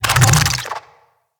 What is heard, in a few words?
Infested Big Ashen Move Start